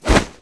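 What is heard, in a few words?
Giant Red Condor attack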